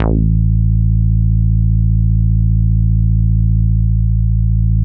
Synths BASS

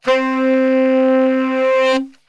C 2 SAX, Brass Super Section; Best Service

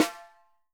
4X10 SOPRANO, Living Drums; Sampleheads; Peter Erskine Living Drums

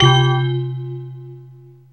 METAL, METAL PERC; Zero-G Ambient 1

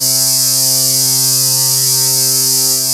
SPACE C2, CLAVI

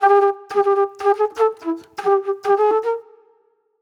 Movie Flute; Sonic Foundry (Sony Creative Software); Manhattan Flutes; Super Brown

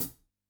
HHCL, Yamaha DD-65, HH

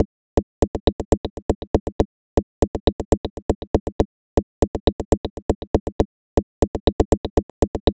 Bongo Delay Beat, Electronic Beats; Sonic Foundry (Sony Creative Software); Myths of Technology

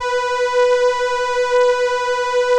ELKA, String Machines; ELKA RHAP

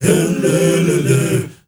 MALE HUM; Spectrasonics; Heart of Africa

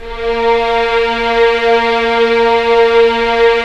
STR Violins, Roland LA Composer

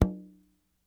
Sogo Med palm, World Percussion One Shots; Sony CS

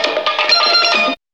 SITAR; AMG - Now CD-ROM (Roland)

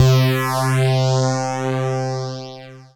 Dance Floor Bass, Multisample Basses

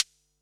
HH, Pearl SC-40 Hihats and cymbals

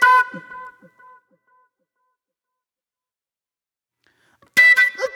Sharp Delay Flute; Sonic Foundry (Sony Creative Software); Manhattan Flutes; Mixed Berry Jam

